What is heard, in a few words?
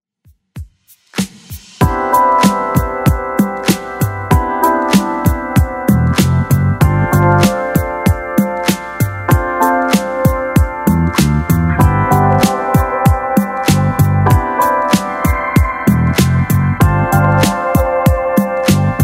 80s Redrum 96 bpm CLEAN